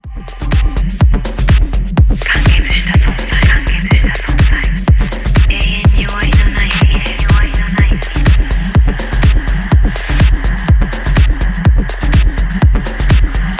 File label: hits